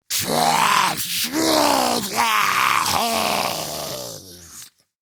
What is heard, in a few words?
Attack Grineer Ghoul